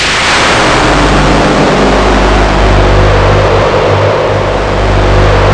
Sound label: THUNDER; East West - Global Sounds; SYNTH FXS